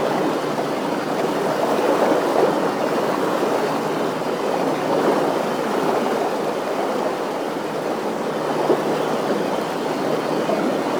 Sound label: Water Pump Bottom Loop; Cave; Ambience; Warframe; Stalker